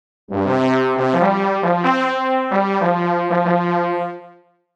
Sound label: brass orchestra; dsk brass orchestra